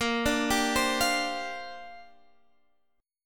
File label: A sharp 6th Add 9th